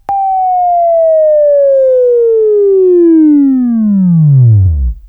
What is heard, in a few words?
WAV Left, Sweeping; Labview-Program; Sweeping Training program